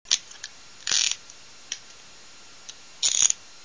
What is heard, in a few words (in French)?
menotte